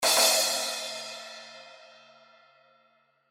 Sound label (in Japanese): jajan